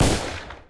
gunshot, FIREARM Handgun B FS92 9mm Fire Short Reverb Tail; Weapons